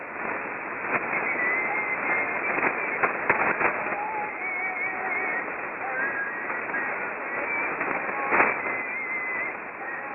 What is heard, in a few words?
the yodeler; Shortwave Pirate Radio